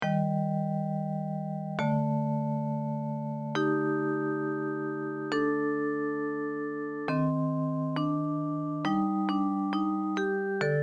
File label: japanese folk song; okazaki no komoriuta